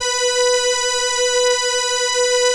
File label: ELKA, String Machines